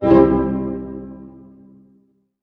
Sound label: HARP HITS C MAJ, Orchestra; Sound On Sound - Peter Siedleczek's Orchestra